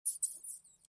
rat idle; STALKER; customnpcs

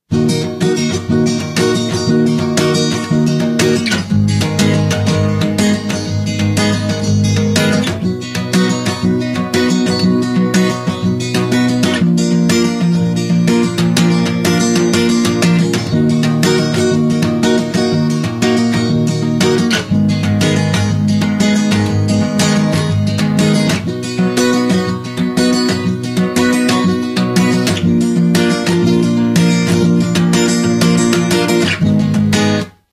guitar; STALKER

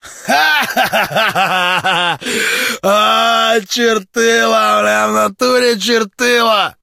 bandit smeh; STALKER; minecraft

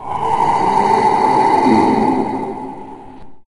karlik idle, mutants; STALKER; customnpcs

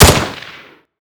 sbr fire; STALKER

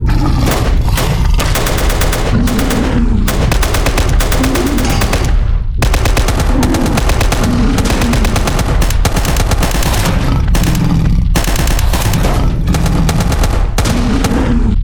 fightscene; STALKER

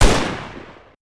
Tavor fire; STALKER

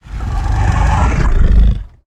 chimera idle; STALKER; customnpcs

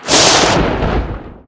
electra active, anomaly; STALKER; somber anomaly

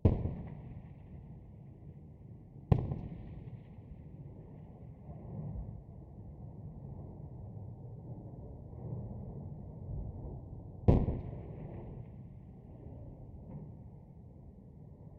ambient shooting; STALKER

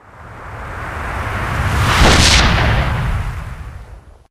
gravi blow; STALKER

